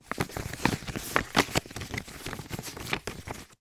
foley paper ticket cinema handling; zapsplat

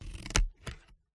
scissors cutting a hard cardboard; Sound Ex Machina

